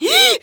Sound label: piglet fear; Bad Piggies